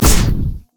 overload; LoL; ryze

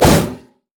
minotaur headbutt; LoL; alistar

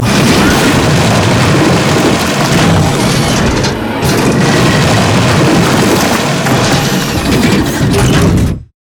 rocksaw; LoL; emitters map8; odin

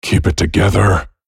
spellcast effort

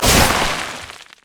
anchorchain pull; nautilus

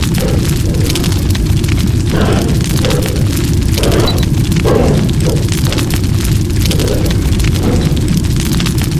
undying rage loop; darkchampion; LoL; tryndamere

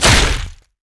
basicattack dragon; LoL; shyvana

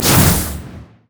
shadow swing; LoL; zed stormninja

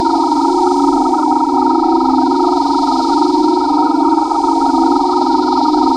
ufo loop; Corki

